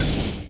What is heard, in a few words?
Perc Hit, drums; Drums & Drones